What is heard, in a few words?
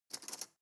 cutlery teaspoon drawer grab